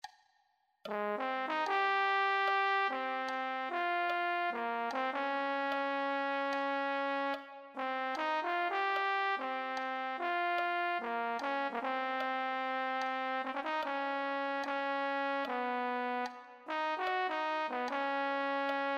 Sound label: euphonium